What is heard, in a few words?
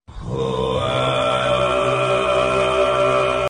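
horror mantra chant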